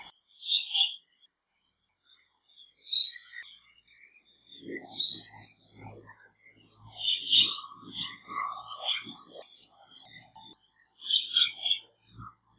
Scaly-Breasted Bulbul Calls Type 1 and Type 2; Kledang Sayong Forest Reserve Ipoh Perak Malaysia

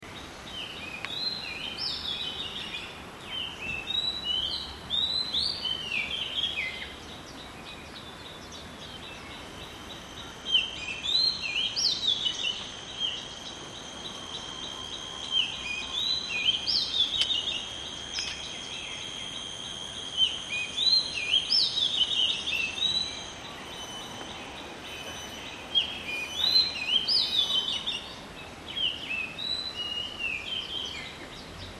Magpie Robin

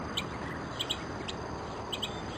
Chestnut headed Bee eater call; Amar

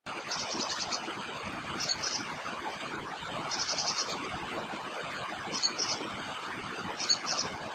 Yellow Eared Spiderhunter calls; Aamr

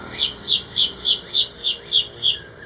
Crested Myna calls; Penang Island Malaysia

